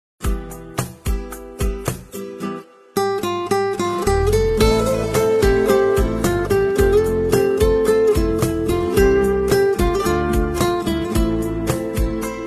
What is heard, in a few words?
Guitar